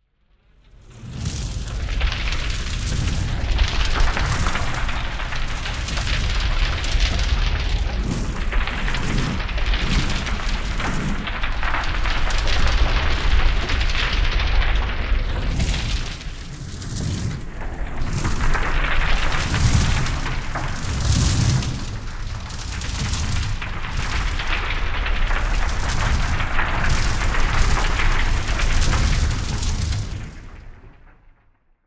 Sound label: Magical Spell Fire, Horror, Magical, Spell, Fire, Whooshes